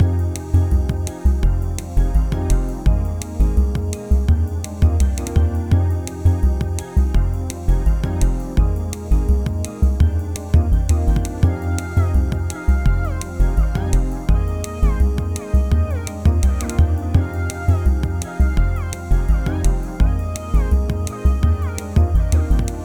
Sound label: Drum & Bass; Best Service ProSamples vol.03 - Drum & Bass 1; AKAI